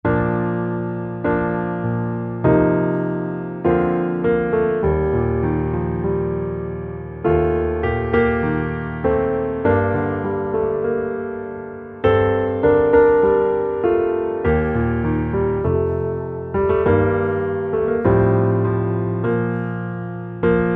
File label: Underscore PIANO; Worship Flow